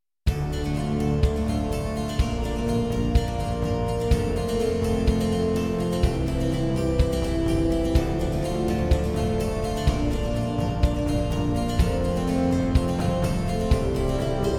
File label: no choir